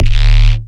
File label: D 808, bass